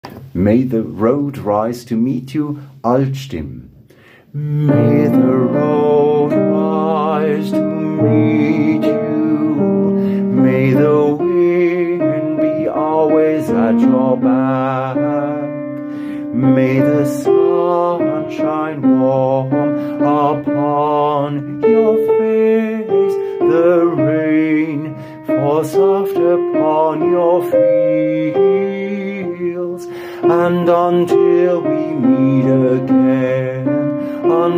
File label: May the road rise Alt